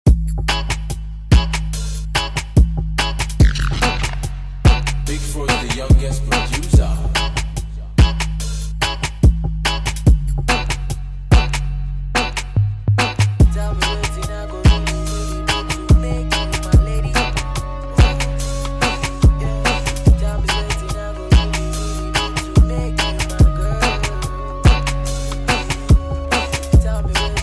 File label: Instrumental Hook